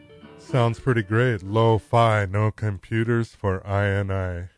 lofi

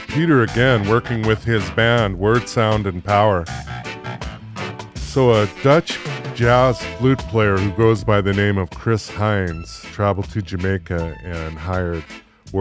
bamboo reggae

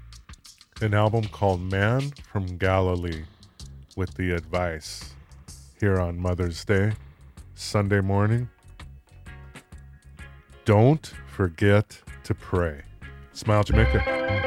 gospel pray

